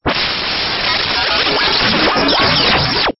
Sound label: Stinger; STINGERS